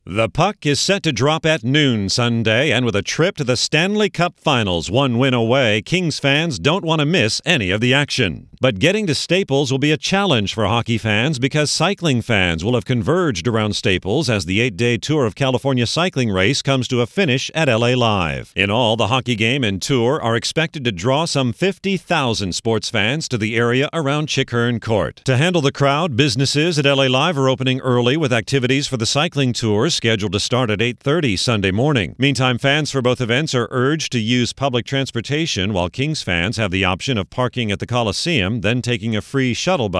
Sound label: staples crowd